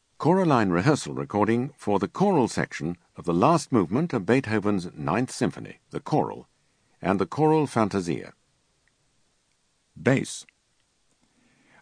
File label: Bass; Beethoven 9th